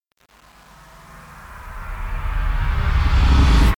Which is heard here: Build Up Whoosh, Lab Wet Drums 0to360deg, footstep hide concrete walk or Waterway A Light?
Build Up Whoosh